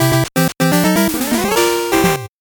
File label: 8bit jingle fast